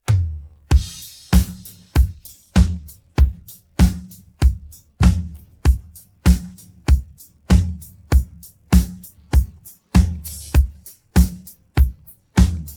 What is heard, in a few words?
pattern, drums